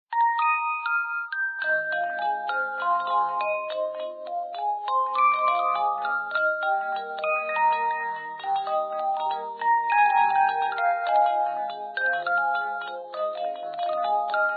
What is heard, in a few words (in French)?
polyphoniques